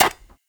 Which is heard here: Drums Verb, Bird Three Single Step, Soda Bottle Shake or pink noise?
Bird Three Single Step